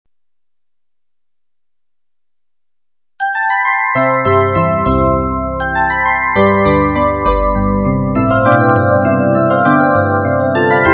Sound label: Harp; Handel